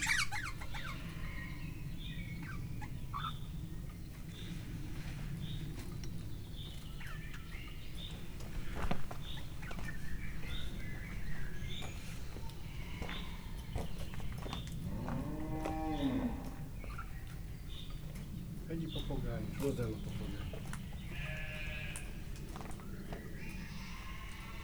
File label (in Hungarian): papagáj birka marha emu ember sip repülő, hangulat; miskolci zoo 2009